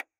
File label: cassette recorder